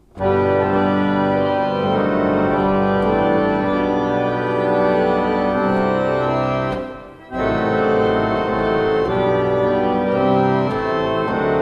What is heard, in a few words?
pipe organ; Rushworth und Dreaper